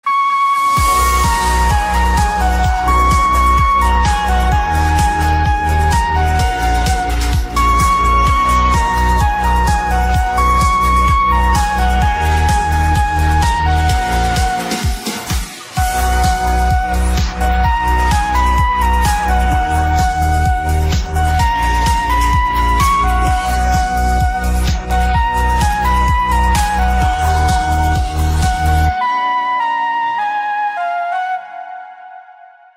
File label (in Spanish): flauta